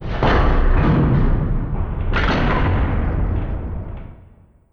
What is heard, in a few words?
Door Open